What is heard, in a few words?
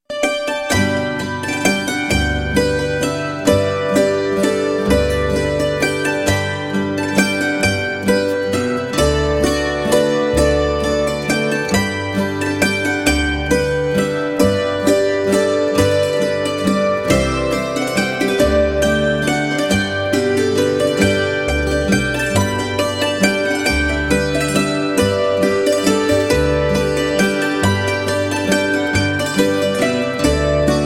The Music Box Waltz